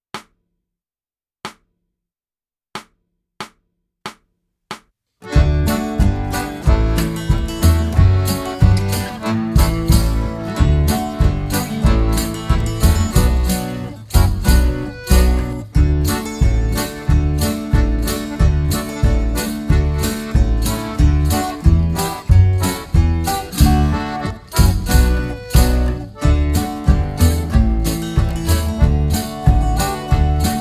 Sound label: WIND instr